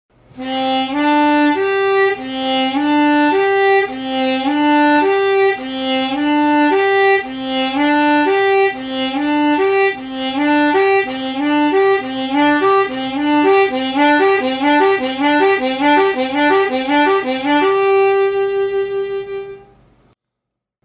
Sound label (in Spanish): sop asp asp, armonica; Ej.5-1sop-1asp-2asp